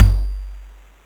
Crushed Perc, bitcrushed; musicradar

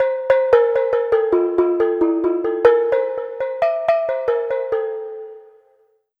Pelog Gong Melody; Melodic; Ambient-Melodics-II

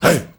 HEY HEY

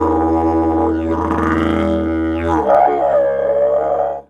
E1 WILD, Didgeridoo; Big Fish Audio - Didgeridoo and Other Primitive Instruments

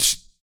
PIERCD LIPS, vocal; ILIO; Vocal Planet VOL-2; Blues & Soul

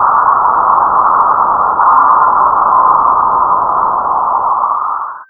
BREATH BOX R, Big Beat Samples; AKAI; SPEAKS KIT

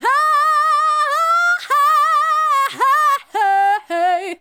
Female Solo, Hip-Hop and RnB Vocals

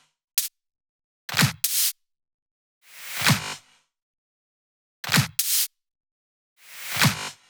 Loops 128BPM, Drumloops

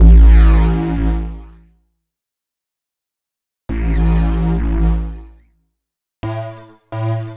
Synth Ultra Bass

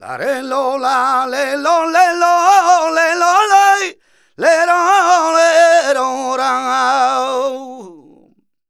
voc c, Flamenco Vocals Male Cantaor

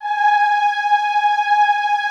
EHH G#4, Classical Choir; Best Service

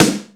FM SD, MOD. DRUMS; East West; Global Sounds

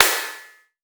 ride noisy, cymbal; hithat crash cymbal

